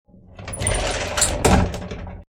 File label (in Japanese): クローゼットを開ける音